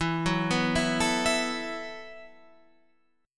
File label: E Suspended 2nd Suspended 4th; arpeggiated; Esus2sus4; 12,9,9,9,10,12